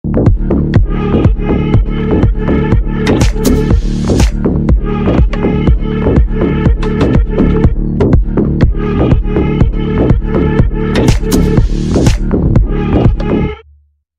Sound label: malayalam ringtone; bougainvillea movie 2024